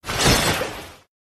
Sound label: slice